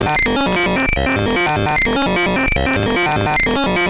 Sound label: fm seq; emu workshop